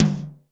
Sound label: Mid Tom